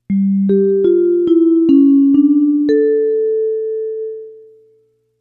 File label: announcement chime